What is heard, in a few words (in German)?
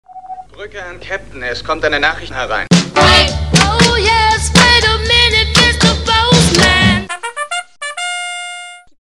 klingel sms toene, sms post